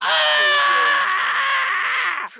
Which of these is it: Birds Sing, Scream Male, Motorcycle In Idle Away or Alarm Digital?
Scream Male